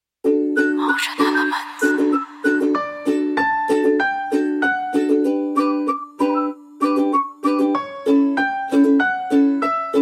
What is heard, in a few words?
background music for video royalty free music